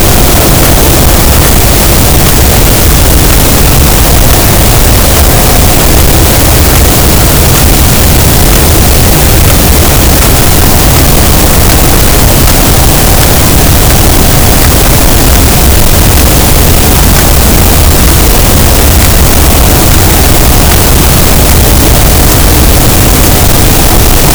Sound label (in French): cachalot positif, Physeter Macrocephalus, gaussian noise